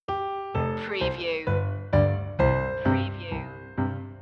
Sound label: piano loop intro; ding dong merrily on high; media music now